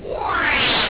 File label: WHOOSH; EFFECTS